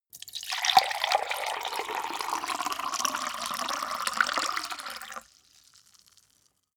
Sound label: glass pour hard; soundpool